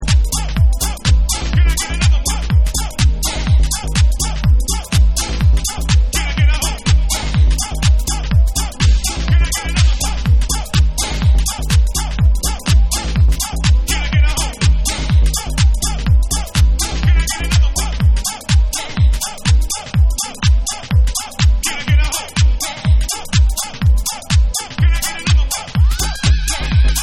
Jazz Groove Remix

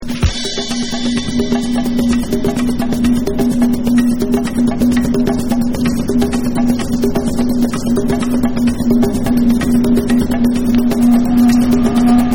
Bonus Beats

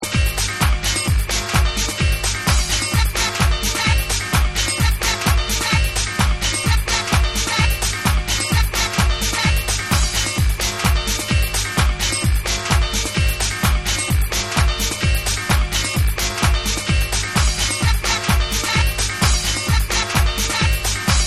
Disco Vibe Mix

